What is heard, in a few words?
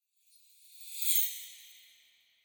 transition sound